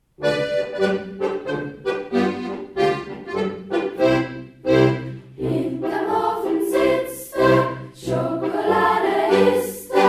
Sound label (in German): Kreuzpolka